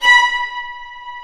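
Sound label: Vio spiccato B4; Digital Sound Factory Virtuoso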